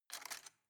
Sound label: cutlery plastic drawer grab soft